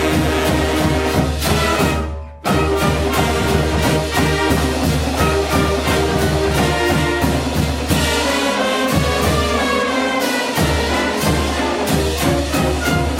Fight Song

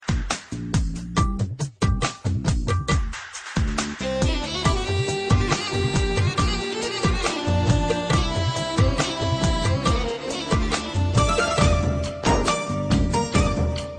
cool classic, electronic ringtones

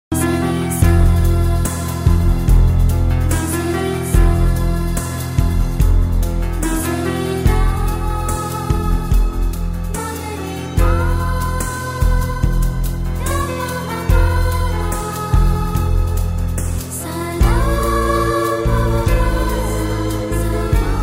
awesome bgm

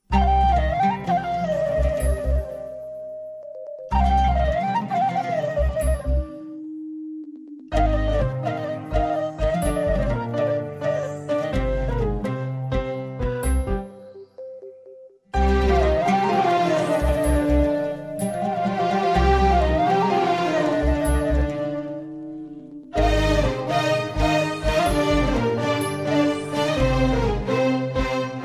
flute playing; classical

